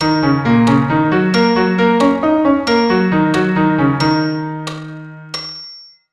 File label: Ebm7, keyboard